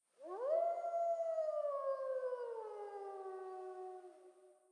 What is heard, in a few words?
WOLF